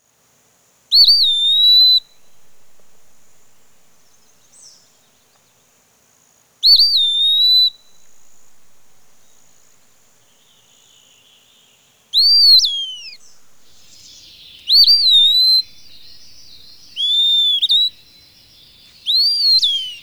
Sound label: peewee; Bird Calls; Ornithology